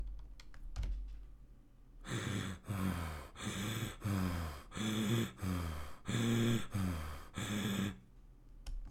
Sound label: Breath Heavy